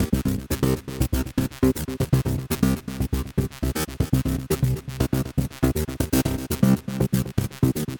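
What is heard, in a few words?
Ambienza Bass Line 120 C minor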